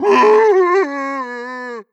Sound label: idle moan dry, zombie vox; creatures